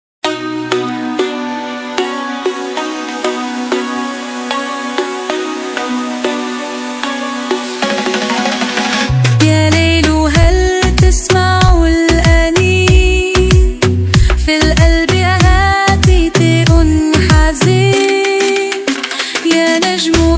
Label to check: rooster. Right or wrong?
Wrong